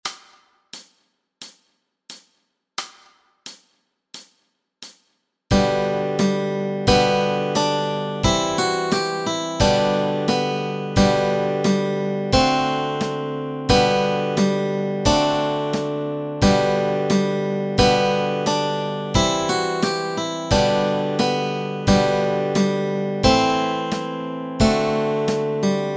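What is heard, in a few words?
melody and chords, Easy Guitar and Ukulele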